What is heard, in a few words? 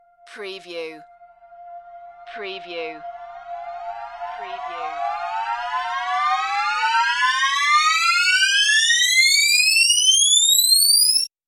Long High Rise